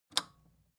record player power release off

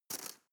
cutlery teaspoon drawer grab

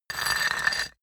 stone tile scrape high

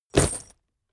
leather metal bodydrop

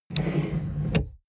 plastic drawer large draw

